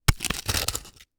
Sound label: Horror Scary Bone Break